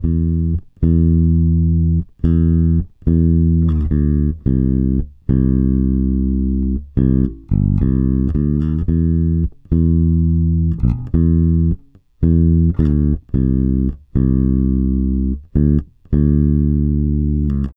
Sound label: Country Bass Verse 2 Alt; Verse 2 Alt 1; Digidesign; Pro Tools Essential Loops